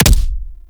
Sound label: Bayonet Punch; Warframe; Tenno